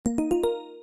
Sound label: msg, chat player